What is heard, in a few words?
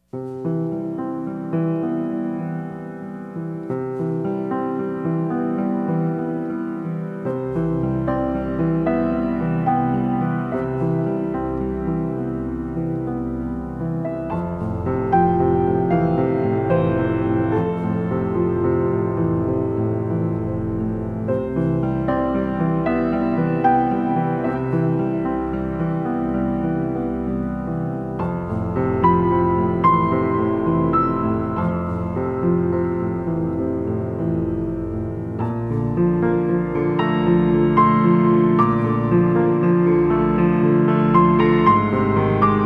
Relaxing Piano Lullabies